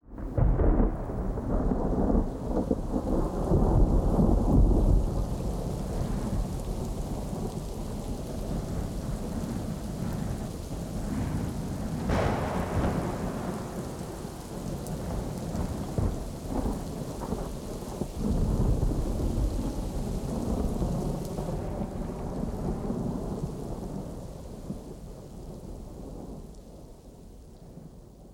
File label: Rain Thunder